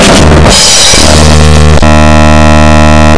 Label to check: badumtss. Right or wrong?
Right